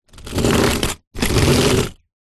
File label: suitcase pull wheels concrete short; foley; zapsplat